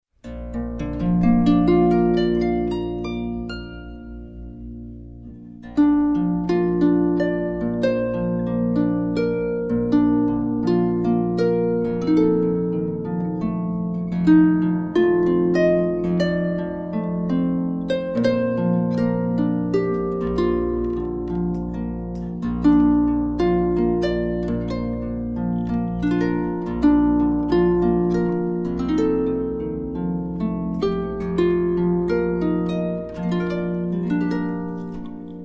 rockabye baby, harp

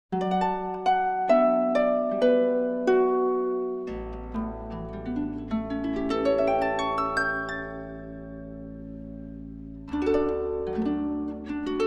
Musettas Waltz

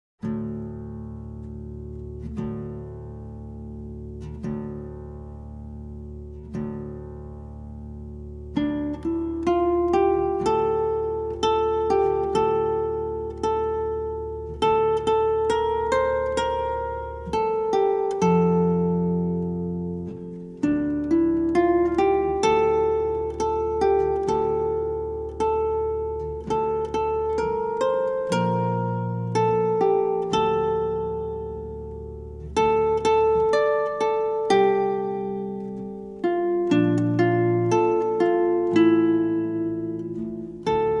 Mortal Flesh Keep Silence, harp

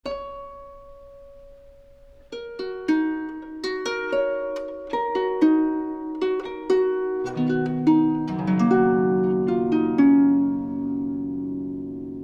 harp; Girl Flaxen Hair

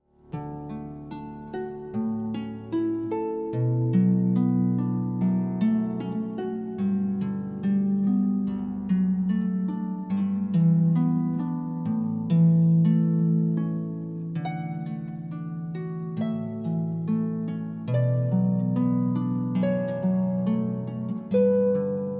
Canon in D, harp

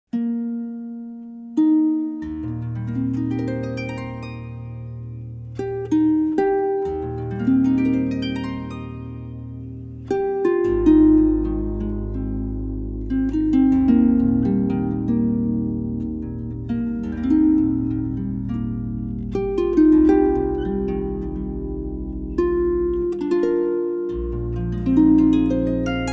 harp; Amazing Grace

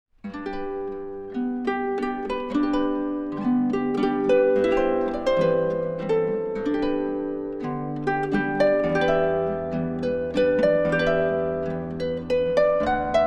Waltz

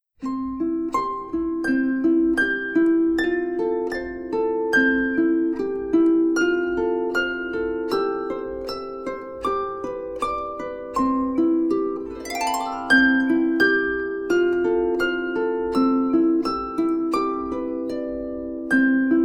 twinkle twinkle, harp

